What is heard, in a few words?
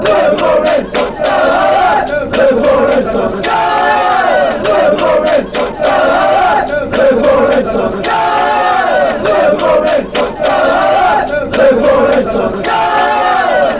fanchants; liverpool red sox